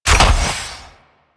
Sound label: Garage Door Open; Ambience; Warframe; Venus Open World